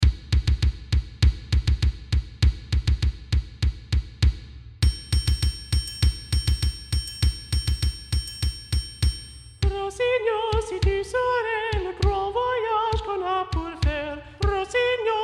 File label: Balanced Voices; La Violette